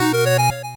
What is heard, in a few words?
8bit se